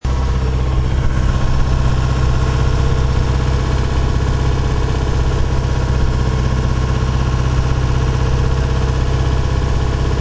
Industrial Generator, Buzzing and humming of an industrial power generator; Gas Pump (Sound Effects:Machines)